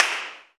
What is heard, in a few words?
middle clap; HeavenStudio; clappy Trio